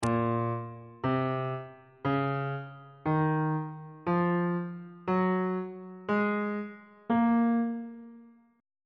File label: a sharp minor scale